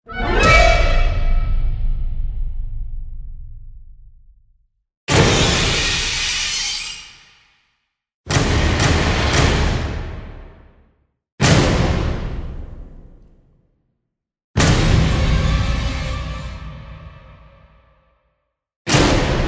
Paranormal Horror Music, Scary Moments; Paranormal Horror Music Bundle

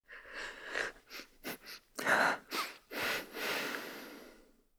Female Breathing; LaundryCinematic

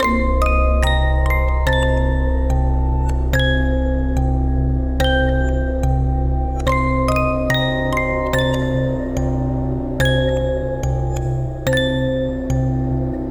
Creepy Musicbox Double Musicbox; HauntedBloodlines; HORROR MUSIC BOX

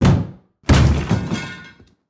Door Kick Open Fall; MinorHallutinationsSounds1

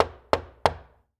Door Knock Door; MinorHallutinationsSounds; SFX Producer

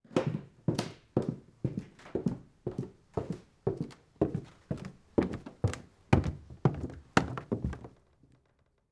Footsteps Wood Stairs; MinorHallutinationsSounds; SFX Producer

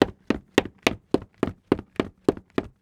Footsteps Wood; HauntedBloodlines; SFX Producer

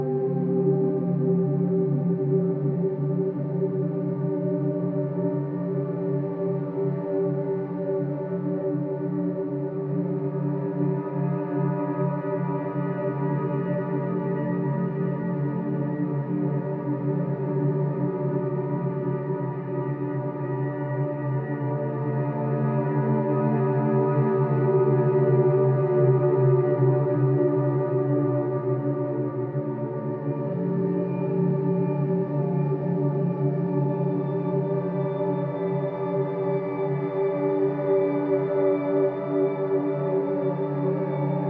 Light, Horror Atmospheres Music

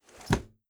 Window Close; Window Sounds; HauntedBloodlines

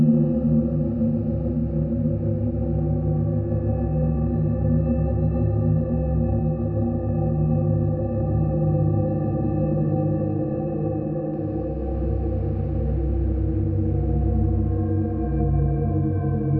Gloom, Horror Atmospheres Music

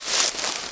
STEPS Bush, Walk; High Quality Footsteps

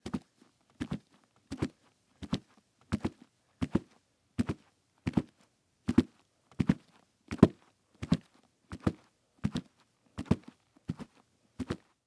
Hard Sole Shoes; Footsteps; HauntedBloodlines; SFX Producer

